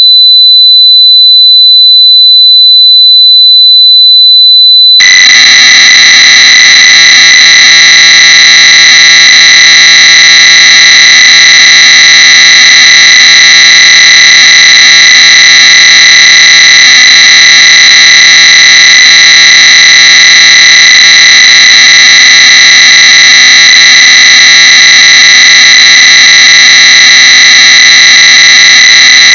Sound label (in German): orgel; Sharp Pocket Computer PC-1350